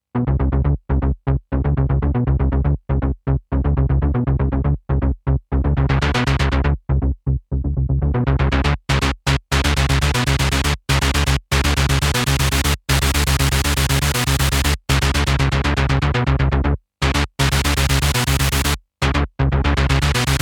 Noise Bass Lead SE02

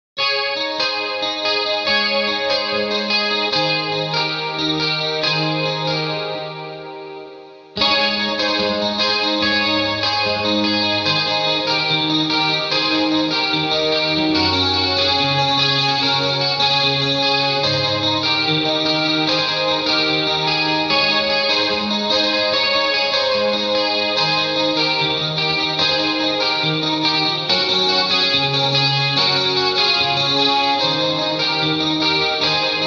Yamaha SPX90 Delay Guitar

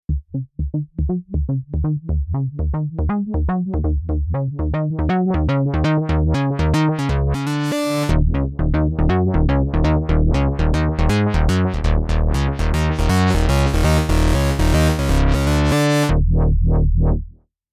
Synth 15 dB; IGS Audio NE573

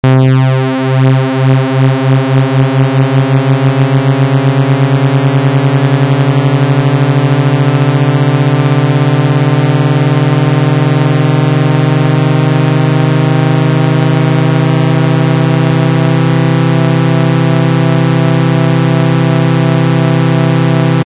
saw detune

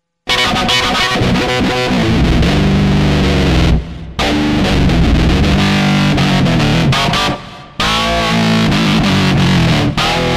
Distortion

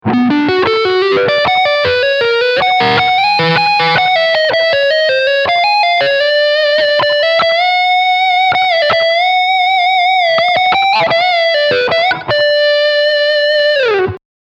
Soapbar Leadline

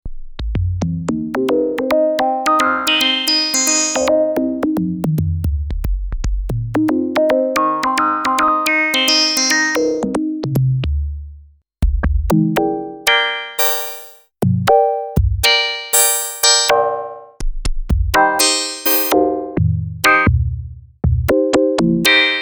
perco

